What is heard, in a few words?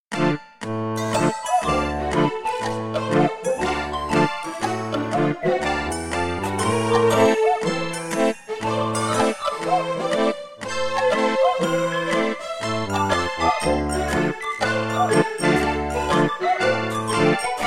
MIDI